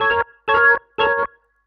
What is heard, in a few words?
ORGN 123, Keys; Houseworx